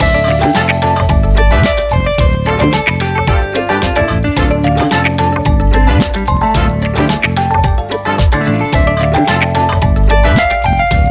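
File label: Funk level end 110bpm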